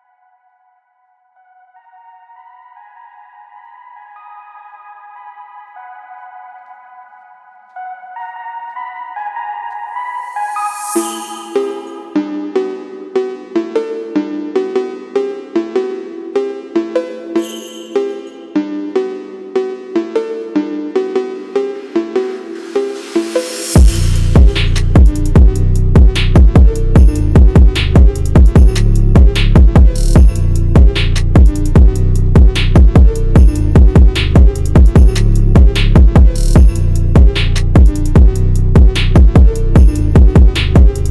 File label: xmas phonk